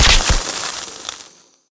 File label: spark sparkles